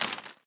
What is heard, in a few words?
Footstep Fertilizer